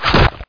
staff hit; Heretic II demo; weapons

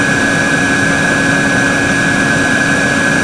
heavy hover move; Army Men TiS

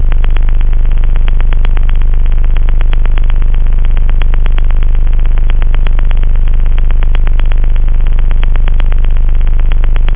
sub base, bass, synth; da capo vol1

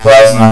PLASMA; Death from Above

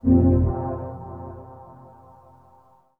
synth pad ambient 160 C; Sphere

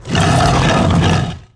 hoplite hit; DAoC; Toa; Gamestar

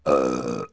belch; homer

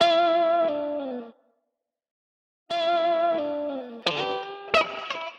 Guit; TOB STUDIO

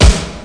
front snare; drums

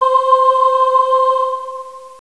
Choir Aahs; Castles - Siege and Conquest

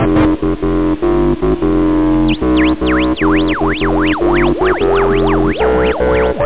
loop techno; da capo vol1; instruments

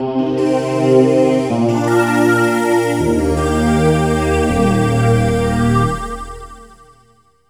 SYNTH PAD AMBIENT 160 C, Ambient; eJay; Sphere

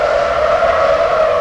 Slip; Racing Days R DEMO

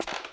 steps; allods2d

